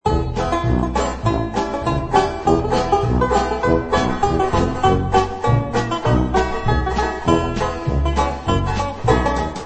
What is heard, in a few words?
Gramophone Country Music; crazy machines 2